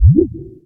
knopka suhaya bulkayushaya

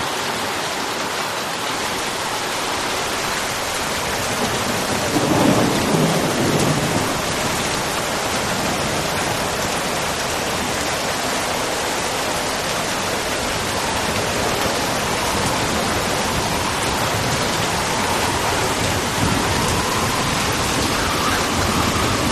ferma silnij dozhd veter raskati groma

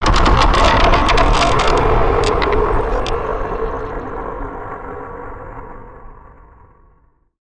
inoplanetnaya forma zhizni

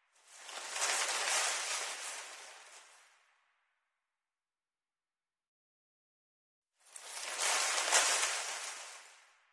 listva shelest listev

